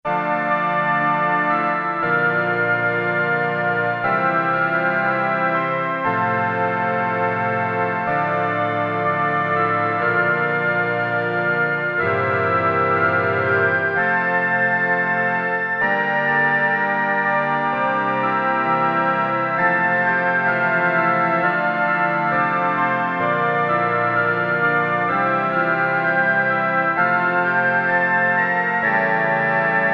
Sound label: Pipe Organ, Casio MT-600; Tones